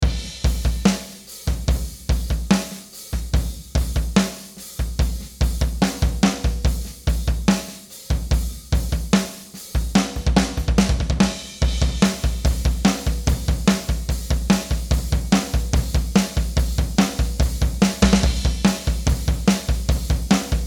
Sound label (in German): drum ohne; waves abbey road rs124